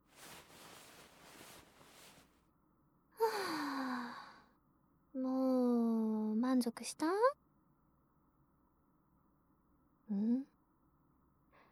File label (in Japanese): あままま膝枕＆耳かき, ASMR; ま～たビクってなったねぇ♪; 【KU100・宅飲み・お風呂】限界社畜OLの同期ちゃんが優しくえらいえらいしてくれる金曜日…; 安済知佳